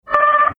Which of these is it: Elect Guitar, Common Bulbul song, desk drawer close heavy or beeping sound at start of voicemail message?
beeping sound at start of voicemail message